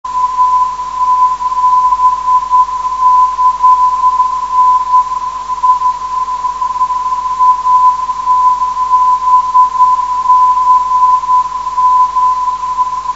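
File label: 320 kHz, non-directional beacon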